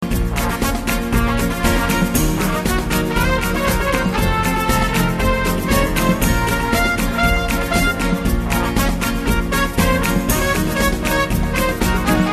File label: Spanish Dance